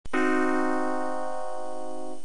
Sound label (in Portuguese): acorde diminuto; musica classica